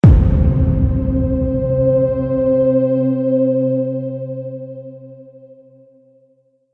Subtle Intensity Trailer Hit

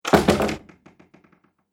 Door Shut; Door Closing

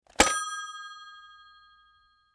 Technology Rotary Phone Hang Up Hard Slam Antique; Telephones; SFX Bible

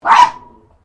Animal Dogs Chihuahuas Barking; Newcastle; Various domestic dogs; Dog Sound Effects; Dogs (Sound Effects:Animals); Foundation1

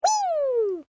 Created Creature Small Call, Alien Sound Effects

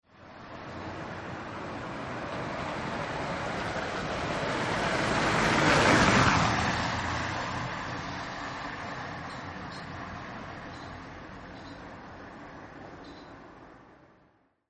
Driveby, car; Vehicles; Partners In Rhyme

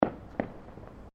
Fireworks Multiple Firecrackers

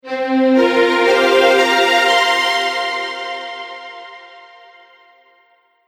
America Stinger 120Bpm; Musical Sound Effects

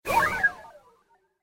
Fast Droid Flyby

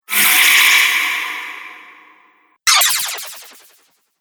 Magic Spells; Magic Sound Effects

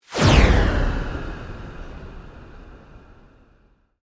Sweep Organic Medium

